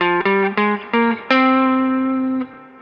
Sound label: Guitar, 70s Analog Funk